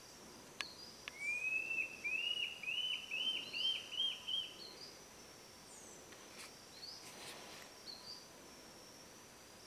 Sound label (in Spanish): Batara copeton